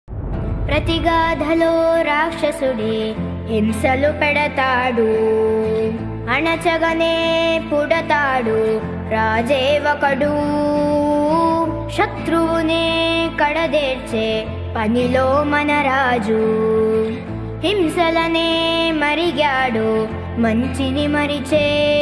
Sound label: telugu ringtone